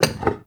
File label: Vase Medium Impact; Objects; Vases